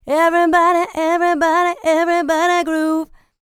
LICKS, Vocal R&B Dance; LT DANCE

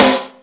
SNARE, Ragga & Dub Reggae

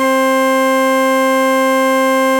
FARFISA ORGAN, Vintage Timetraveler; Sonic Reality

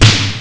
snare drum easy; ST-XX Amiga Soundtracker Sample Packs

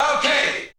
vocal

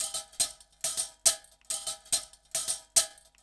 Perc 105bpm, African Drums, Extra Percussion; Quazibeat; African Secret

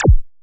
JX ZAP; HIQ+ZAPS

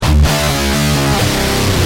G Heavy, Guitar; Industrial

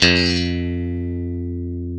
guitar, e-guitars

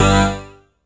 Synth Hit G; Zero-G Trance Inducer; 90 s Sample CDs

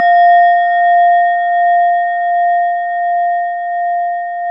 JAPANESE, Japanese Bowls

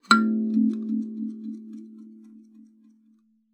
KALIMBA A3; Xsample VOL-7

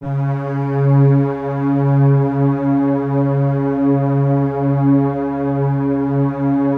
C#2, Keypad; Standard Instr; Keypad 3

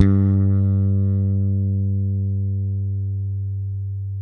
FRET BUZ G, PEDULLA BASS; Masterbits CLIMAX 7 - Bass

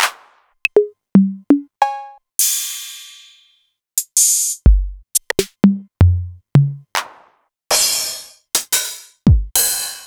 Novation Drumstation; DrumstationV1

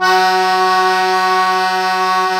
KEY Cajun, Accord Trio; Club-50 - Foundations Roland; Accordians

